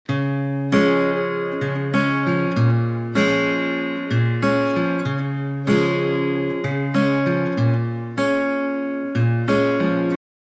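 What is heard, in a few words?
gtr after, guitar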